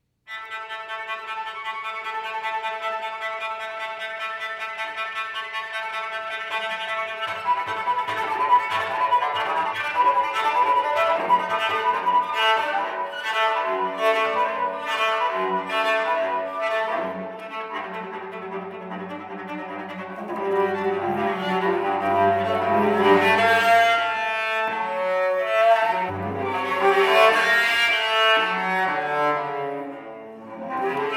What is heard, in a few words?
Molto espressivo, energico, Solo Cello